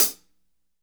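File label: hat closed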